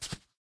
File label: step; smite; god serqet